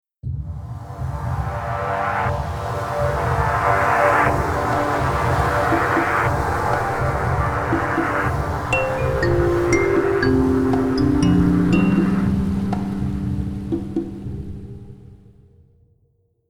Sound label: Ancient Ambient Logo